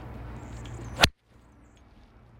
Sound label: 5 wood